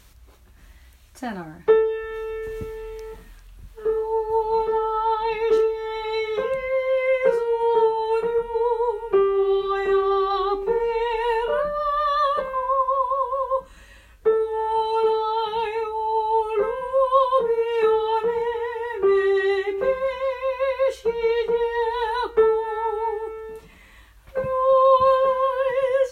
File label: Tenor